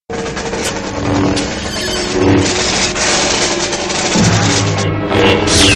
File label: lightsaber struggle